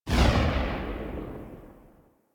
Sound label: Arch Long Rifle Tail, Weapons; Warframe; Archwing